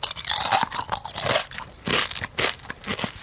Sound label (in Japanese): taberu oto